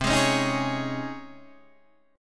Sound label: C sharp Major 7th Suspended 2nd, C